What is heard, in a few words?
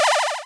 shot